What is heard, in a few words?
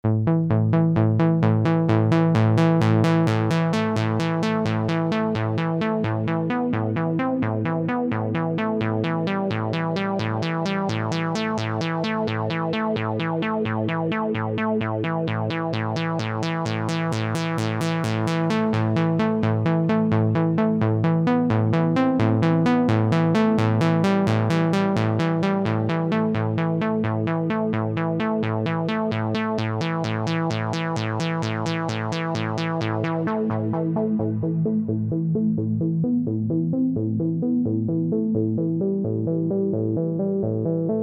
vc lfo